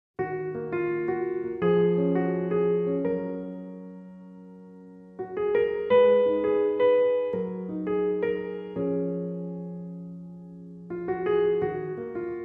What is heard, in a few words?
Nice Piano Drama